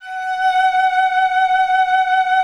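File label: cello vibrato, F#5; Xsample VOL-7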